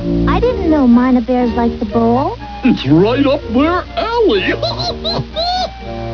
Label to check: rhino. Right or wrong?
Right